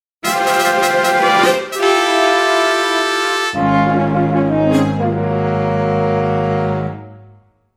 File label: Fanfares Princes Entrances